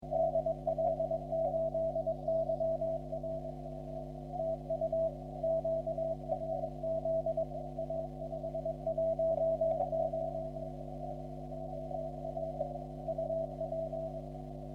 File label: 40m cw; 1h 13 utc; sur HF2V